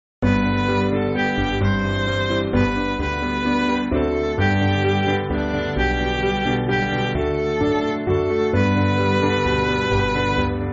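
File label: Midi, Music Backing Files for Churches; Jesus Is All The World; Thompson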